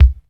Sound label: smooth bottom end kick drum c key